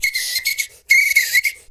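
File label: foudang groove; Best Service; World Colours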